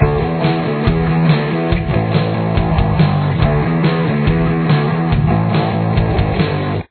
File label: pre verse guitar